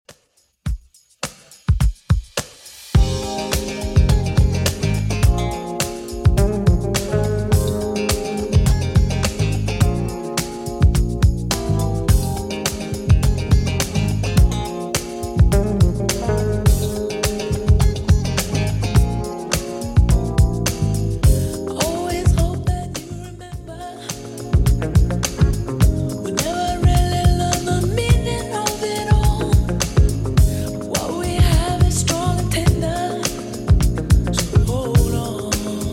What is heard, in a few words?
80s 105bpm; Redrum